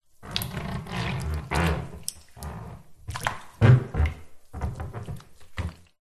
household bath water very shallow movements body rub squeak room reverb; zapsplat